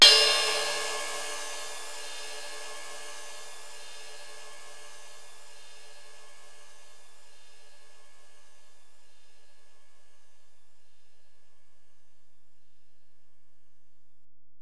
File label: Ride, Alesis DM-PRO